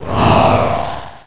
Bound Chest Spirits Roar

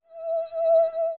Hover Boots; Hover Boots activated

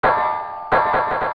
Iron Knuckle Crumble

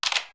Camera; Take a picture with the Pictograph Box; Zelda

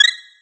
Get Heart Refill; Health refilling

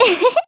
Show Harp; Zelda showing Link her new harp and clothes; Skyward Sword

